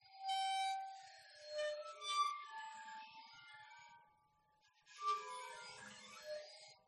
waterphone long bows dynamic; aston stealth

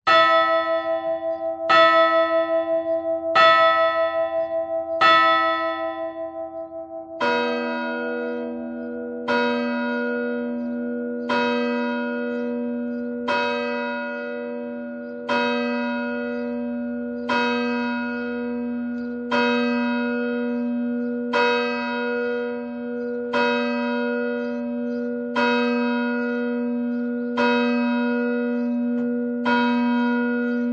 church bell ringing village Germany

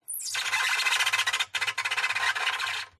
Wood Stress Creak Squeaks; DPA4060; PMSFX

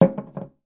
food ketchup glass bottle down on table; zapsplat

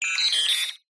science fiction robot android beep chirp classic

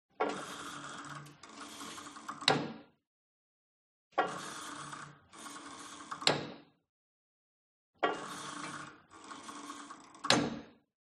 SPORT GYM Cable Machine Old Pull And Drop Soft; smartsound

